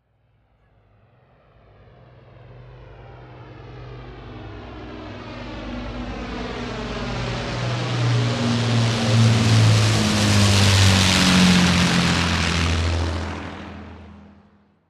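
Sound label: Plane Lancaster overhead Prop; hurricane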